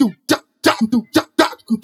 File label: Batida Drums Loop 130BPM; Marcos Guaruja; Joias Do Brazil